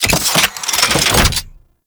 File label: Rolling Drone Turret Spawn; Warframe; Grineer